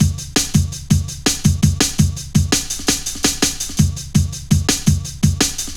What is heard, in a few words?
Fat Rolla, Jungle Drum Loops 166.5